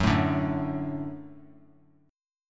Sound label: Gb Minor Sharp 5th Gb; strummed; JGuitar